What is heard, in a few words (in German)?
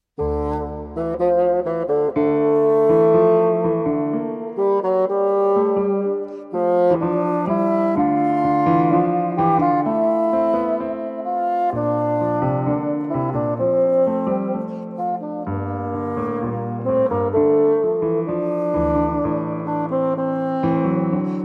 fagott klavier, adagio; hofmeister verlag